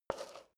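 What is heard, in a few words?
step high heels concrete slide short, footsteps; schritte; menschen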